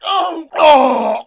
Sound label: death; Daikatana